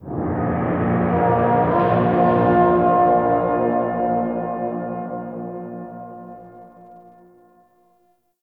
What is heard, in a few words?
Officer Stinger; Interrogation; Dax